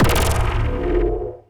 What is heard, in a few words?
attack